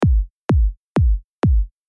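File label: 140 explosion kick dry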